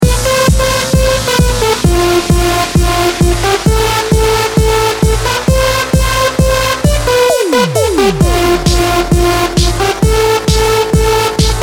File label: MIDI